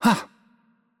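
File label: Attack Light, Italian; bloom minigame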